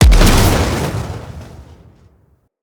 Rocket Launcher Explosion; Weapons

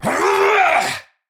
Attack Effort, Battle; Warframe; Tau; Dax; Knight

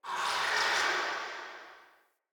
cavernous water lap rock distant; Ambience; Under City

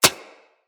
Bullet Impact Generic Metal; Weapons; Surface

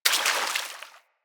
Bullet Impact Debris Water; Weapons

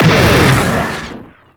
Lizzie Fire Shot Loop Start; Warframe; weapons

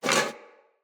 Foley Armour Fill B; Enemy; Mockeries

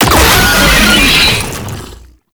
Proto Infested Brachiolyst Death, Vocals, Enemies; Warframe

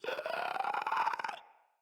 Infested Runner Patrol

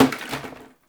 Janitor Plastic Bottle Water Drop; Ambience; Gameplay; Warframe; Nineteen Ninety Nine

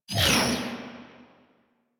Laser Start; minigame; boss; Warframe; Caliber Chicks

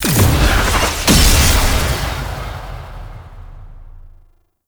Menu Select; Warframe Menu; Excalibur; Awakening Remaster

